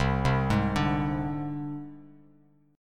C Suspended 2nd Sharp 5th, down, Bass Standard; Csus2#5 arpeggiated; JGuitar

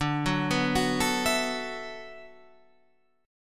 D 6th Add 9th D, Guitar, Standard; D6add9 arpeggiated; JGuitar

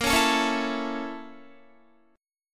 A sharp Minor Major 7th Flat 5th A sharp Guitar; A#mM7b5 strummed; 18,16,19,18,16,16

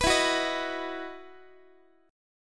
E Major 7th Suspended 2nd, Guitar

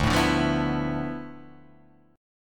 D Minor 6th Add 9th

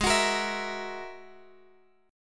Ab 7th Suspended 2nd Sharp 5th, Guitar, DADGAD

